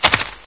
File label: step; MapleShrine; mkxp; gameasync